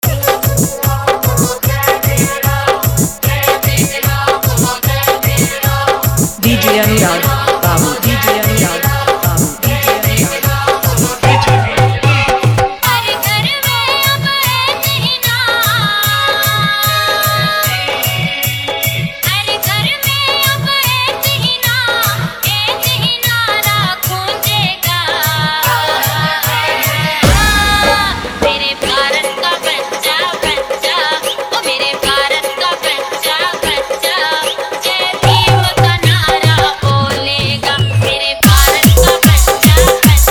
Hard Dhollki Mix

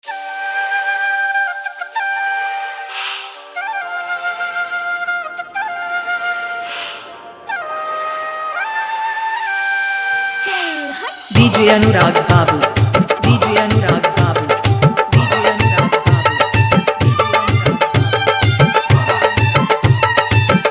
Jumping Beat Rain Dance Mix; Fadu

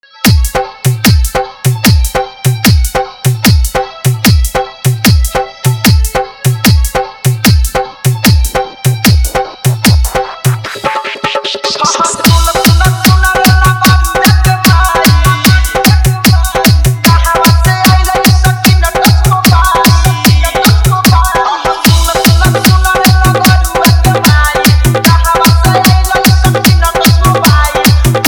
Dhollki Mix

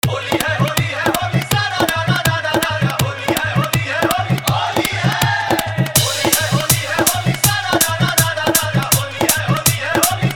Holi Dhollki Bass Dance Mix

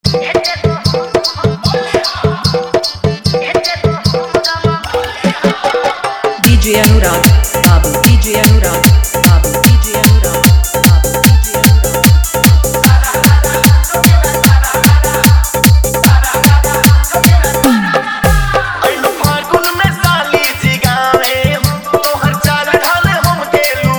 Holi Remix Bhojpuri Full Dhollki Bass Dance

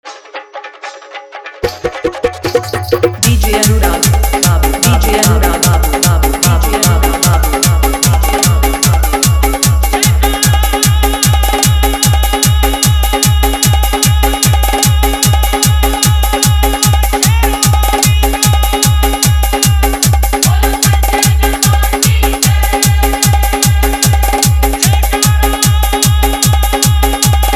Full Jhan Jhan Bass Hard Bass; Full Tahlka Mix

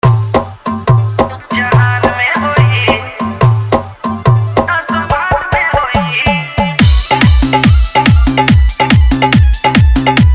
Dhollki Bass Mix

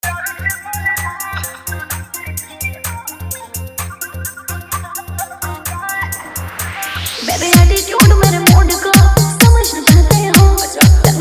Dj Remix Hard Bass Mix

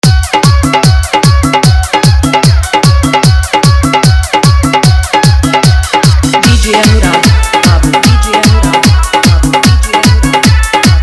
Dhollki Bass Mix

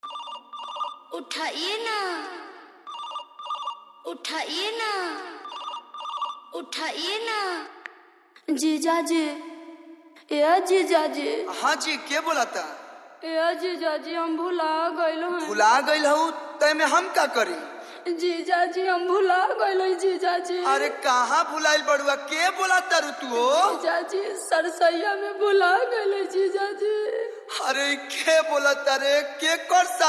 Holi Hard Bass Dance Mix

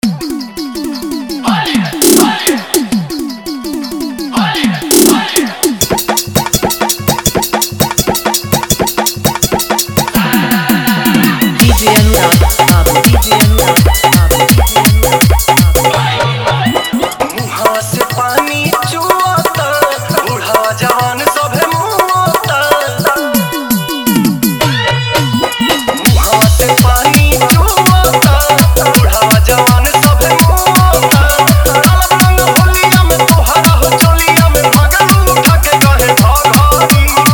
Holi Dance Jhankar Mix Dhollki Bass

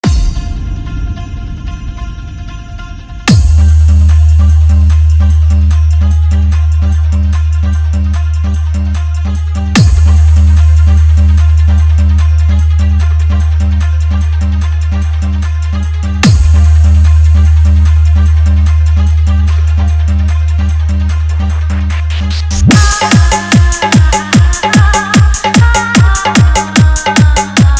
Hard Bass Full Dance Mix

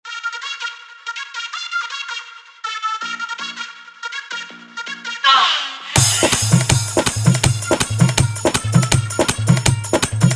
Bhojpuri Full Dhollki Bass Dance Mix

